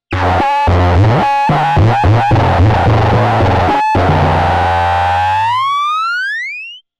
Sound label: tabla jomox